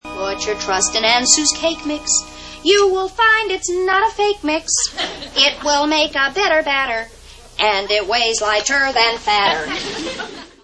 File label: Jingle; Cake Mix